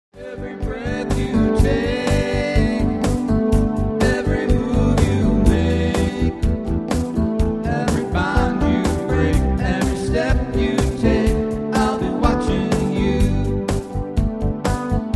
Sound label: Breath Cut